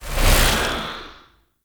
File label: Beam Stop; Warframe; Entrati; Conquest; Vampyric Liminus